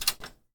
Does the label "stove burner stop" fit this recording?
Yes